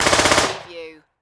Submachine Gun FN P9; Sound Effects Bible

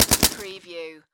Submachine Gun Uzi

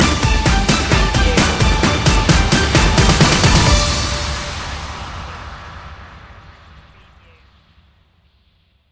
sports hero stinger

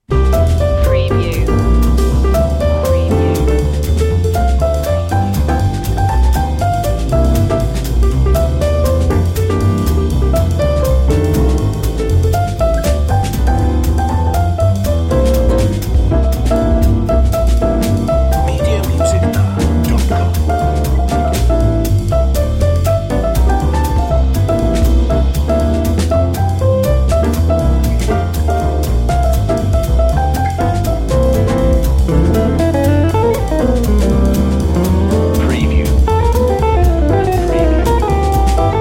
jingle bells jazz

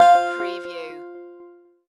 Multimedia Alert

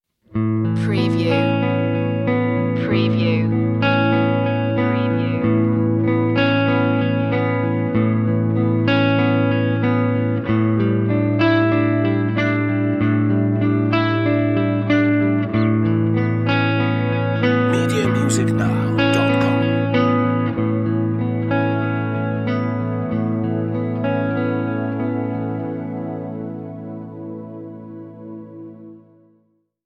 guitar dreams

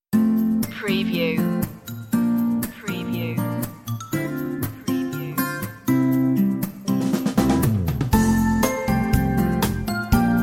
a cheerful morning

